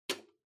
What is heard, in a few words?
Portable Stereo Earphones Unplug